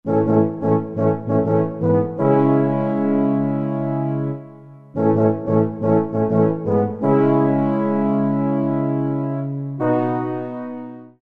Brass, Kurzweil K2000; Factory Sounds; Kurzweil K2000 V3

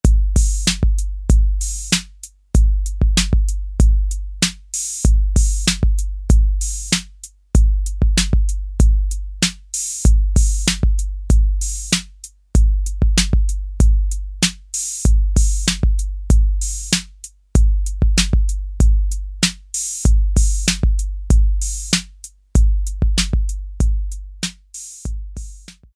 ROCK, Roland TR-808; Factory

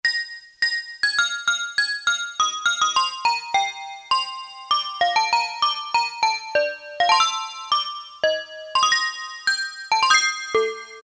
BELLS, Casio Rapman; Tones

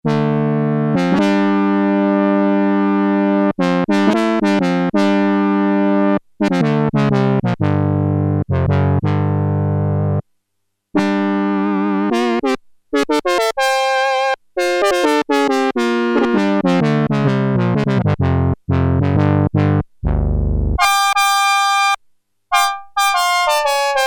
Brass In Fifths; Sequential Circuits Prophet-5 (Ver.3); Factory Patch

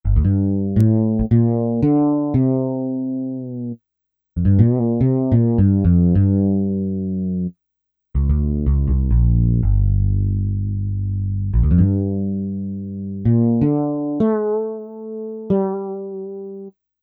Fretless

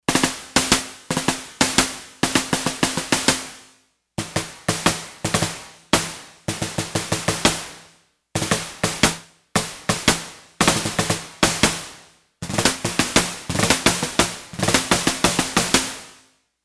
Swiss snare, Yamaha TX802; Factory Patches; Voices

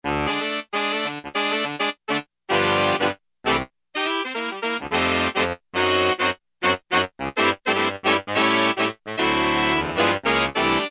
Oct Sax, Roland U-110; Factory Patch

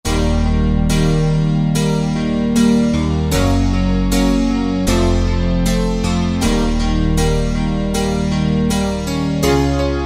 MIDIed Grand, Roland JD-990; Factory Patches

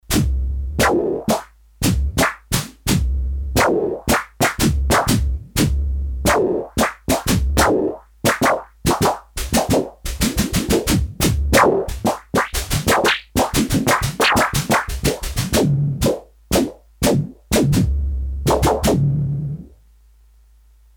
Korg DS-8; Factory Patches

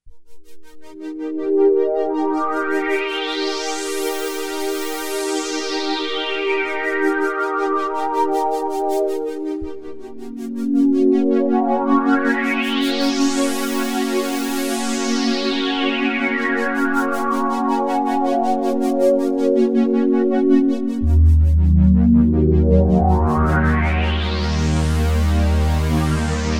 Flashlight Pad

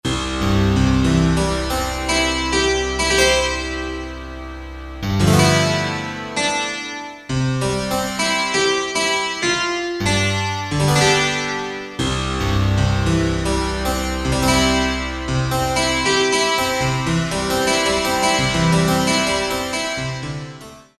Bright, Harpsichord & Organ; Korg DSS-1

